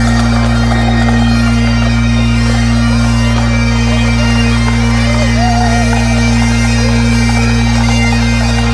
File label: raw tuned Bb sine; Space Pipes